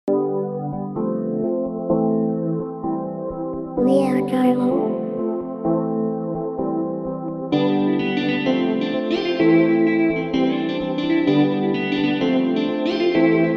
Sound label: afrobeat instrumental; Dragon Ball Sparking Zero